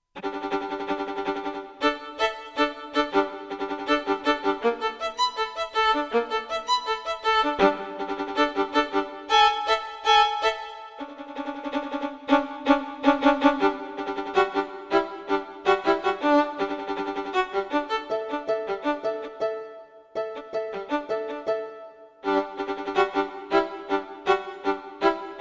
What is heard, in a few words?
Roundabout for solo violin